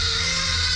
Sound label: LOOP; Rubber Chicken Software Sample Bank